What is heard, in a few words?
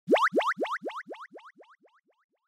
Anime Motion, High Delay